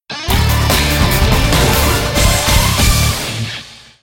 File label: Jingle Win; ACR Drift